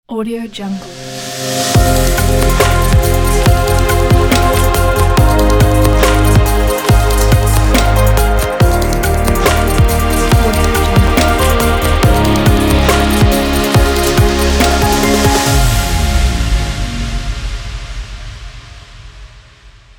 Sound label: glitch logo intro pack; imgsound